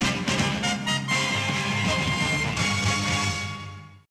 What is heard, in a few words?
Stage Clear Intermission; super spacefortress macross ii; arcade